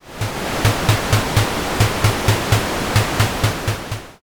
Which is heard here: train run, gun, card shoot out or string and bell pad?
train run